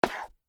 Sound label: Pumps Scrape, Footsteps, Peep, Toe, Wood, Surface; BlastWave